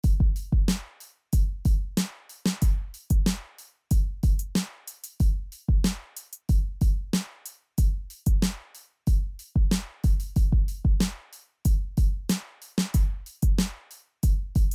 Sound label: Drums